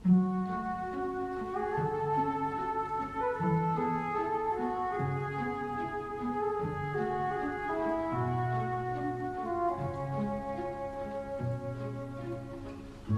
MUSIQUE CIMETIERE; cimetières

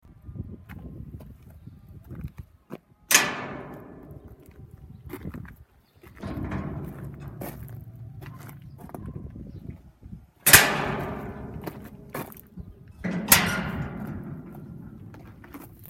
PORTAIL ENTREE, BRUITS DE PORTAILS

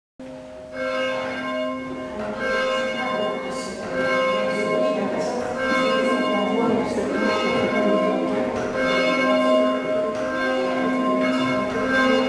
cloche fin; LE VERT 79

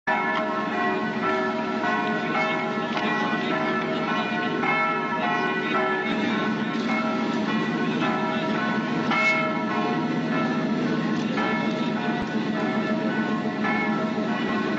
CLOCHES; DE GAULLE

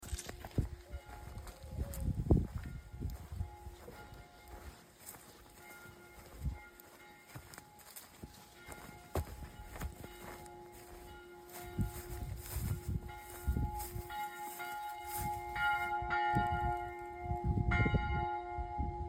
cloche départ, EGLISE; LUSSERAY 79; EGLISE SAINT GEORGES

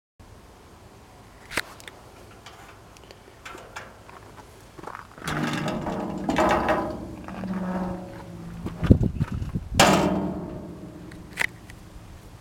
PORTAIL, BRUITS DE PORTAILS; SAINT MARTIN LES MELLE 79

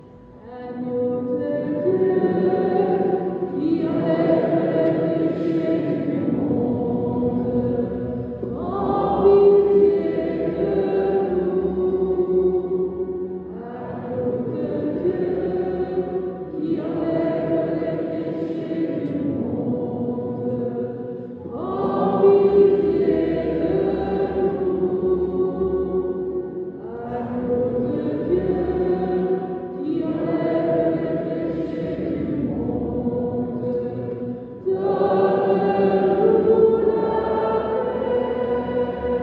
MESSE CHANT AGNEAU DE DIEU; CHEF BOUTONNE 79; EGLISE NOTRE DAME FETE PAROISSIALE

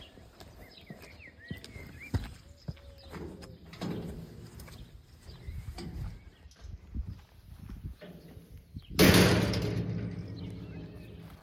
BRUIT PORTAIL ENTREE; VILLIERS SUR CHIZE 79

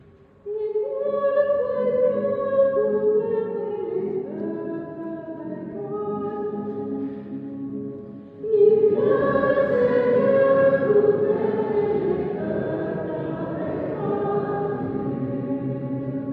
CHANT PSAUME, MESSE; CHEF BOUTONNE 79; EGLISE NOTRE DAME FETE PAROISSIALE; PSAUME 97

